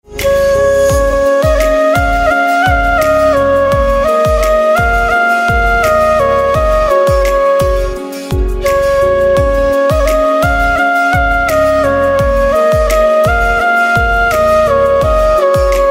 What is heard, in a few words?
Flute Instrumental Ringtone; devotional instrumental ringtone